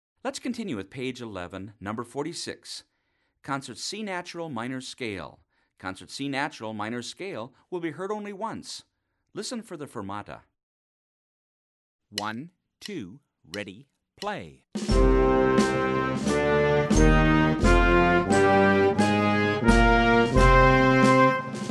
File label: scale concert c natural minor